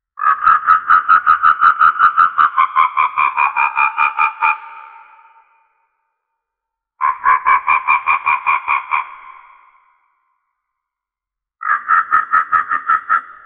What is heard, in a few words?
avian